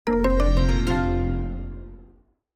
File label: Acceptance Sound 6 Note